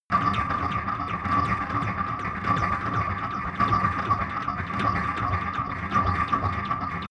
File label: sci-fi deep sea, atmosphaeren; soundpool